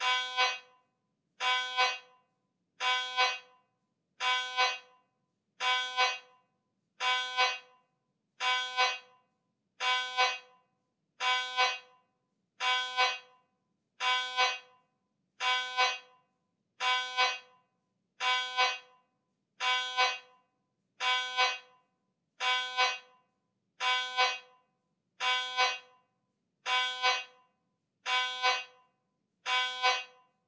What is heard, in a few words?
siemens 3t magnetom prisma fit whole body human scanner mri t2 haste